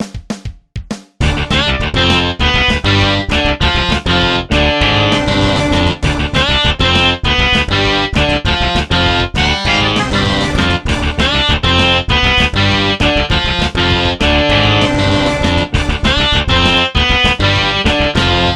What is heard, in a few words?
Elevator; Technodrome